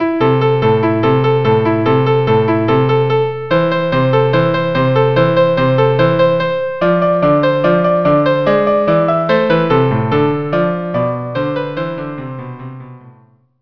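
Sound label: violin cello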